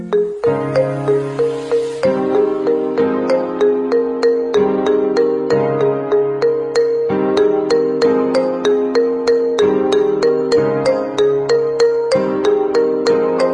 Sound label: Instrumental Song; ringtones